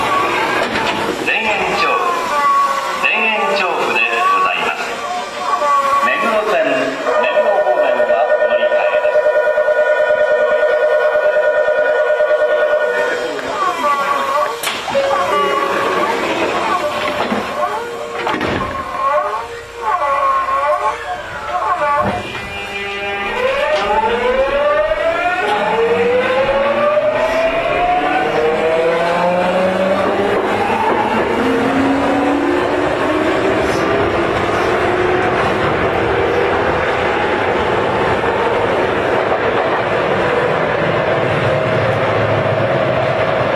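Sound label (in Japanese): 走行音, 9000系; Tokyu; 田園調布-多摩川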